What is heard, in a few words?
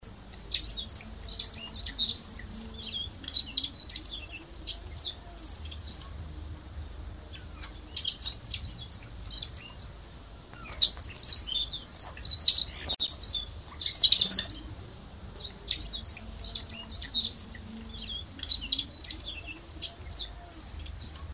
Prunella collaris